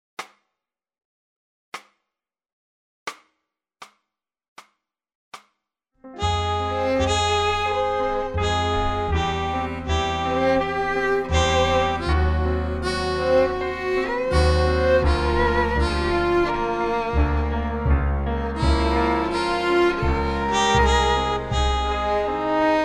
Fm armonica

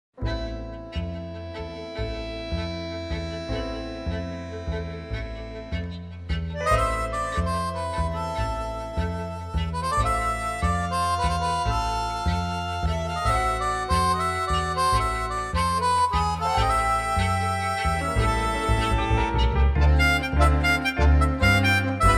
Am armonica

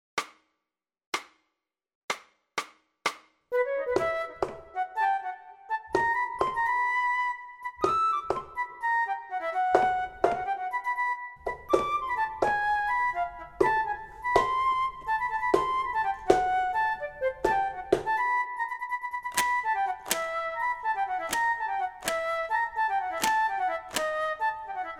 Fuga Em

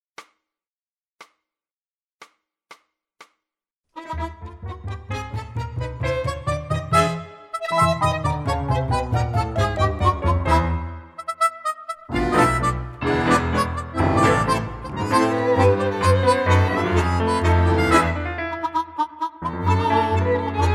armonica

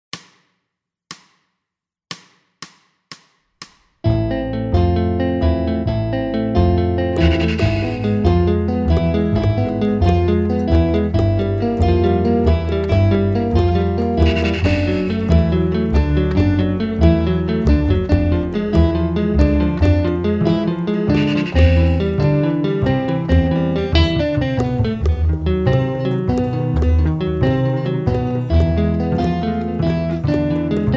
Milonga

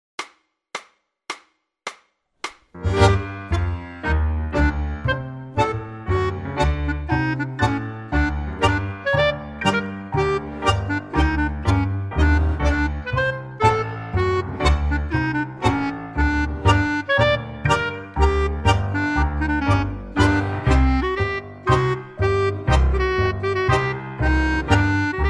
Gm clari sampler

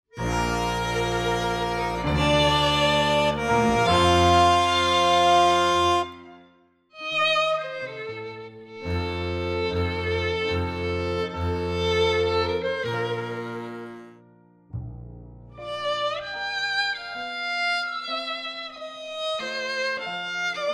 Himno nacional argentino Bb

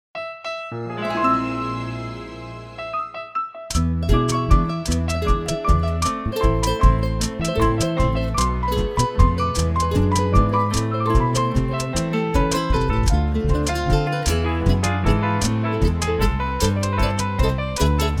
con violin

